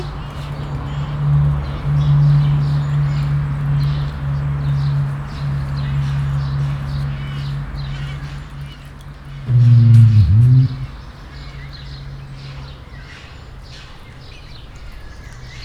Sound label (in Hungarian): közúti forgalom zaja is, nandu; kecskemeti zoo 2016